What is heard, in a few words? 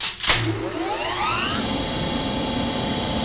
SERVO STA, Cyber Boog Servo; E-MU Producer Series Vol. 3 – Hollywood Sound Effects; Science Fiction